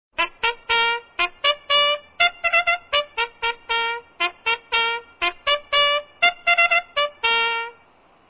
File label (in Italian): cavalleria, tono di notifica; per Cellulari Android o Iphone; Cavalleria Ver. 2